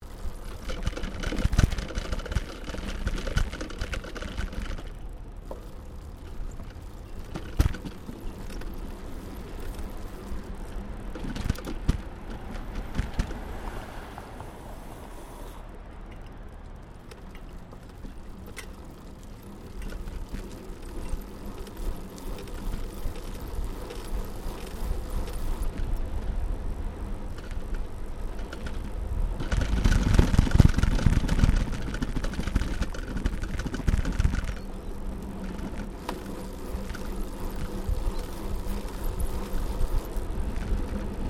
Bicycle Riding Paved Surface Stereo, 21 speed road bicycle; Giant Crx3; Bicycles